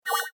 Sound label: Rollover, Short; Mouseovers (Sound Effects:Multimedia)